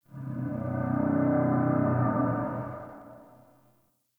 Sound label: Tree Gnarled Oak Moan; Trees